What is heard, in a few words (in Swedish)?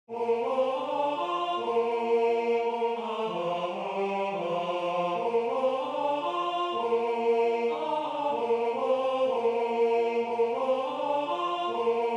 TENOR, ovningsljud